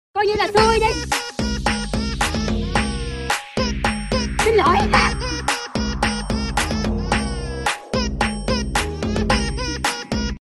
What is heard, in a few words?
remix